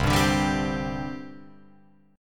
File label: C Suspended 2nd, C, down, Guitar, Open F; Csus2 strummed